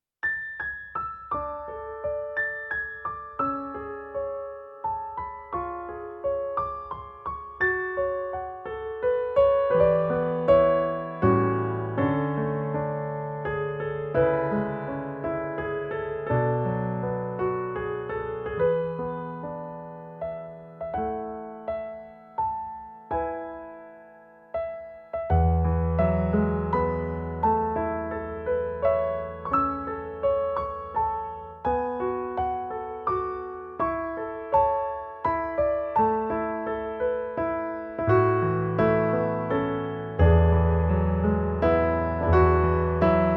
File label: Romantic Happy Birthday Piano Cover